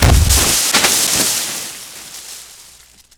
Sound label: Crushing Tree; 1944 Battle Of The Bulge